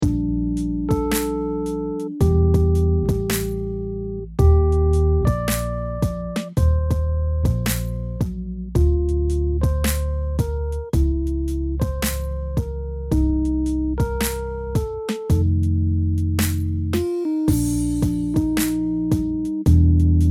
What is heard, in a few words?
Hip Hop feel